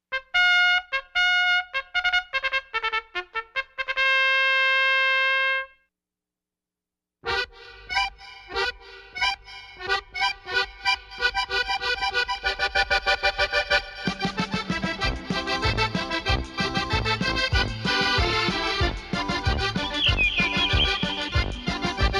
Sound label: Glockenspiel; Festhaus